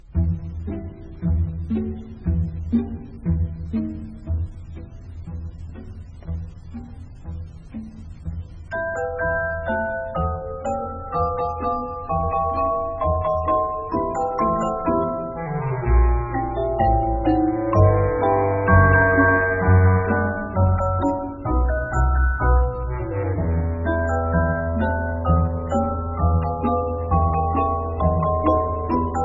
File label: celesta